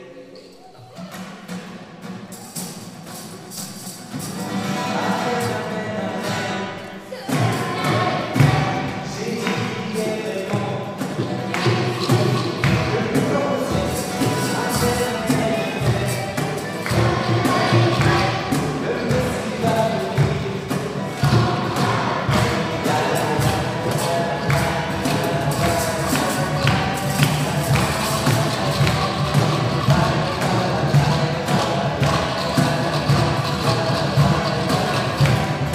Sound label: Amen Amen Amen